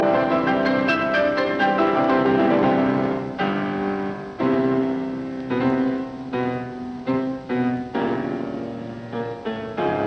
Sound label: Piano Concerto No 1 in E flat major I Allegro maestoso Tempo giusto; Claudio Arrau